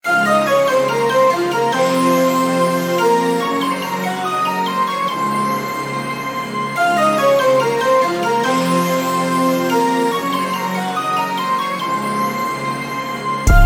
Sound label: Instrumental